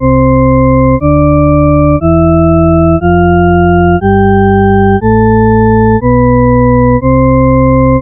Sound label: shepard major; Nyquist Example